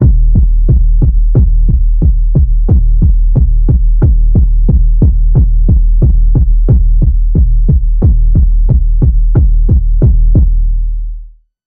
Underwater Pulse Wave D sharp

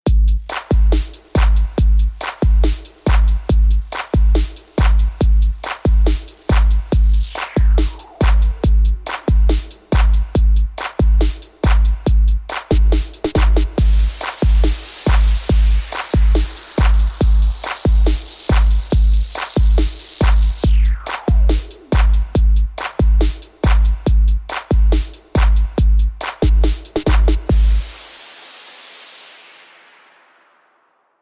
Dance Cave Beat

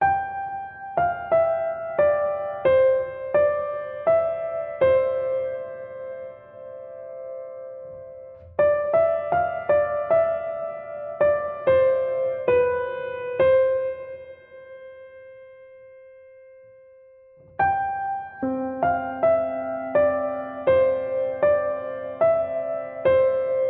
Deck The Halls, Bright

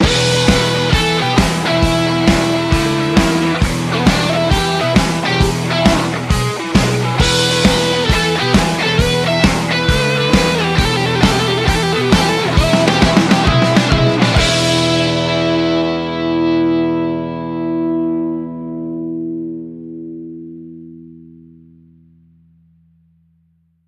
Hard Working Blues, Sting

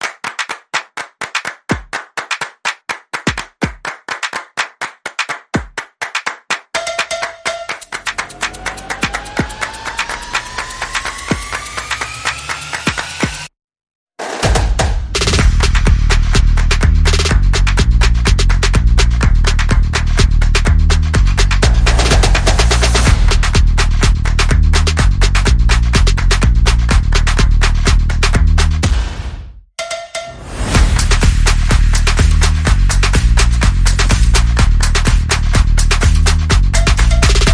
Percussion Bass and Effects

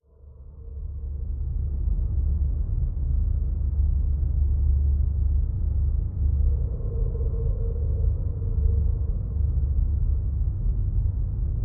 Abandoned House

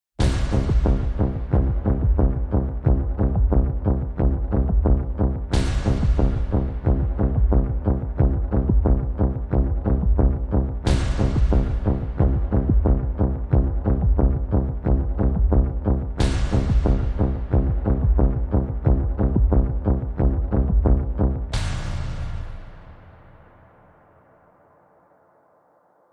Spooky Tension, Drums and Bass

